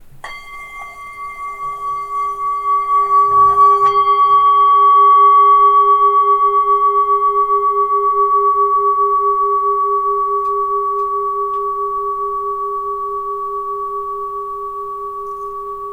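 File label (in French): bol bengale 5-600g Fa dièse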